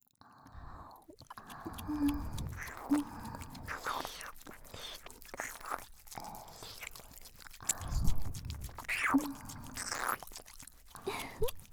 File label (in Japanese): W耳舐め, ASMR; オスイキフィニッシュ; 射精誘導